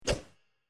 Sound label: open